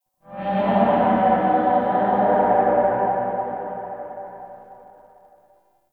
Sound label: Town Distant Horn Alarm; Ambience; Gameplay; Duviri